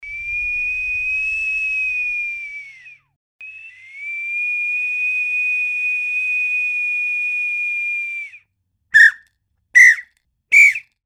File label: whistle